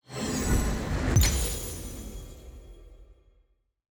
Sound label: loot blue essence fill